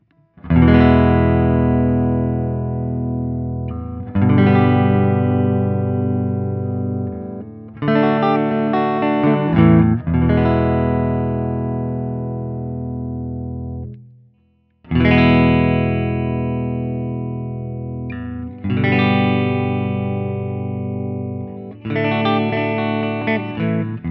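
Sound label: Guild T Bird ST 90 ODS100 Open, guitar; P90; Guild T-Bird ST P90 in Pelham Blue from the Newark St. collection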